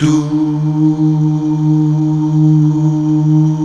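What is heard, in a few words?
Male Duh; Best Service Gigapack 1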